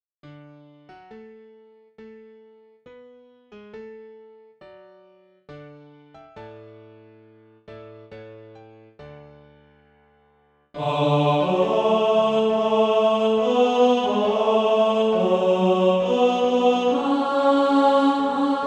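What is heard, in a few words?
tenor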